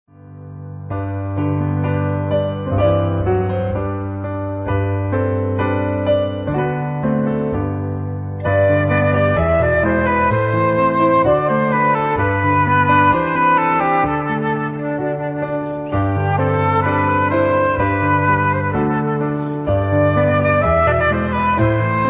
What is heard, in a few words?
christmas piano duets